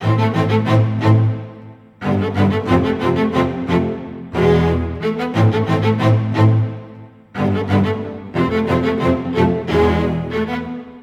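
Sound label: 90 A minor, Low Strings, Orchestra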